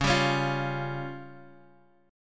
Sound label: Db Major Flat 5th, Guitar, Half Step Down